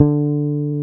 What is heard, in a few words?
BASSES, Roland